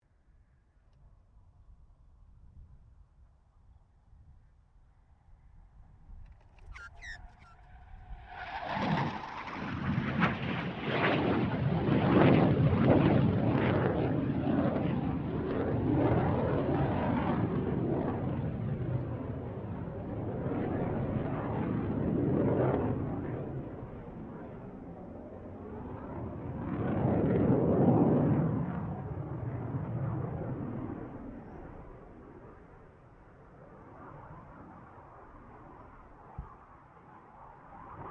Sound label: BAE Hawk 127 pass; Airplanes